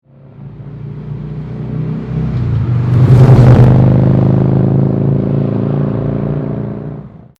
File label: FLYBY, Deluxe Slip-On Mufflers For Harley Trike; Exhaust Sound; Tri Glide; 2009-2018; Vance & Hines